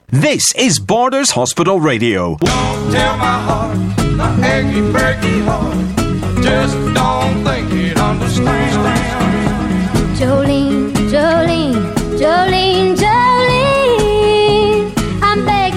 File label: Jingle